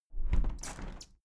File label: window plastic squeak close regular sequence